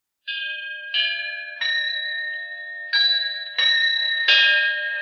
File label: Temple Bell